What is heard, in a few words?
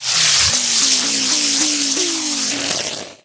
Fireworks Wheel Launch; Angry Birds Rio